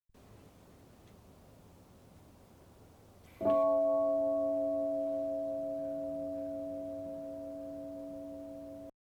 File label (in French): cloche